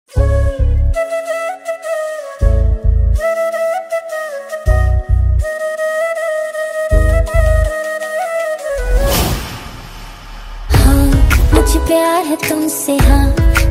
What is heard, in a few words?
song ringtone